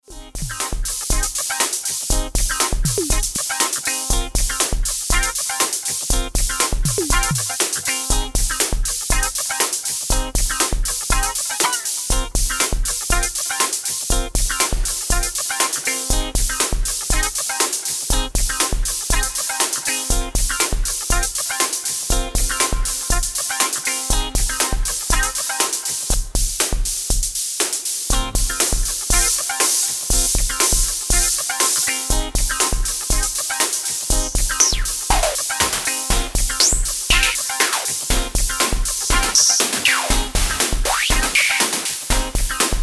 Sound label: nanobox razzmatazz Classic Drumsounds Guitarsample